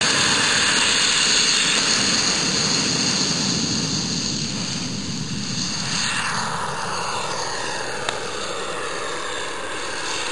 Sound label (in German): Flug Start